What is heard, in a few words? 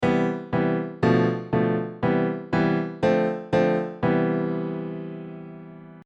Ionian prog; Modal Harmony; Modal Harmony: An In-Depth How-To Guide